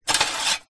industrial tool metal file lift out of metal bucket containing other tools; zapsplat